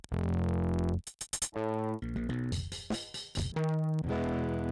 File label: Saturator; Virtual Analog